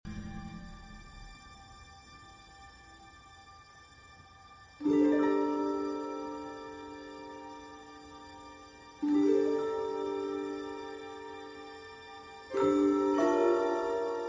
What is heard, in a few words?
Cello Concerto II. Blues